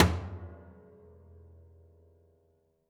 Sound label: Body Thump Sgl, Piano Destruction